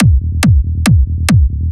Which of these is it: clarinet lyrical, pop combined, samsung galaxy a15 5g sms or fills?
fills